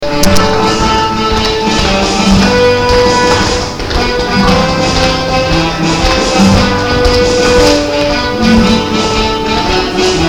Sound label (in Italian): canzone inglese